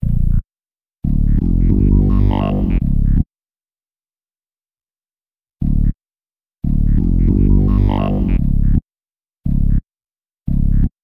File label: Bass, Hip-Hop